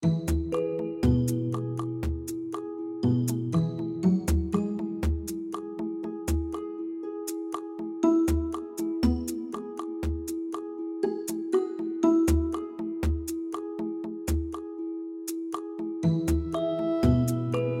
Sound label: Calm Bouncy Loop; Tales of the Tiny Planet